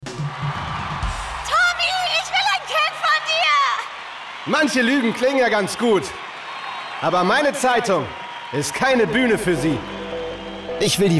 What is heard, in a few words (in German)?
Rock Funk mit Jingle